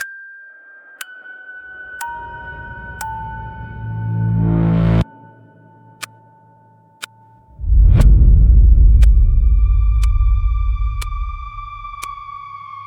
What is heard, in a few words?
Abandoned Clockwork Séance, spiritual spooky soundscapes